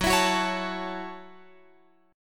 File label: Gb Minor 7th, Guitar Open F; Gbm7; strummed; x,13,16,13,16,16